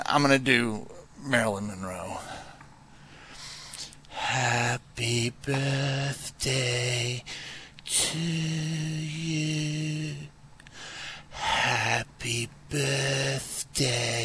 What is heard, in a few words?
singing Happy Birthday